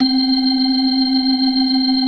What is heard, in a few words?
FAST VIB, TREMELO+VIB, Synth and Organ